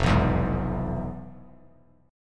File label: F Minor Major 7th Flat 5th F Bass Standard, chord; FmM7b5 strummed; JGuitar